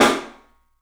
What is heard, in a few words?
PIC SNR, PICOLO ROOM; 3D Sound